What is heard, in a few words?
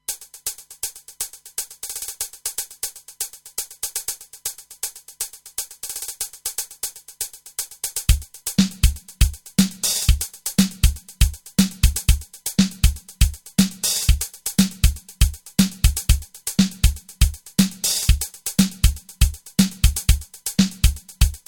Drumloop